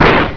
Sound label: dash